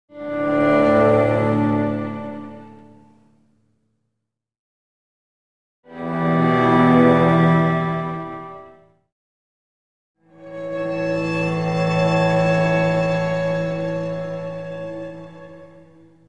Music transition - Soft violin and cello ending chord; Hollywood Edge Sound Designers Tool Kit